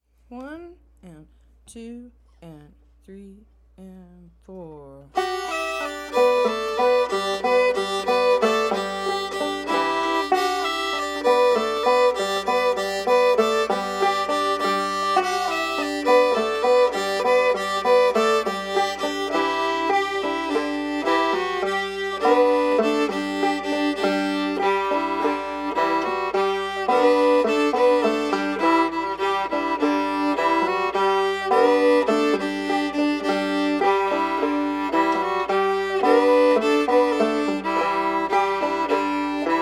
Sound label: Slow, fiddle